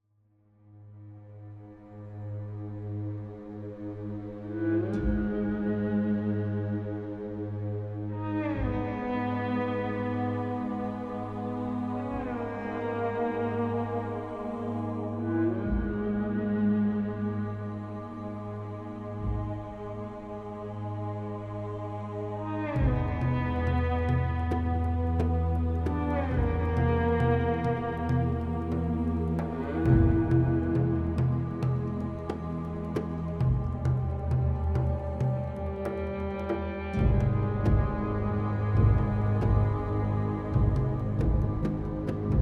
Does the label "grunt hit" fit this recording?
No